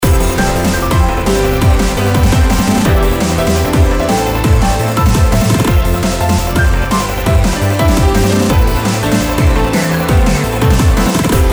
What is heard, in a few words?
Cubase Massive; ArtCore Demo